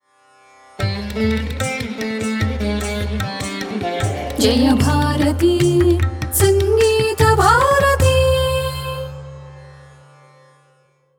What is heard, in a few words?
Title Tune; Sangeeta Bharati